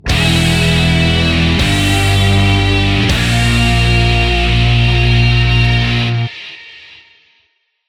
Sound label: Boss Battle Intro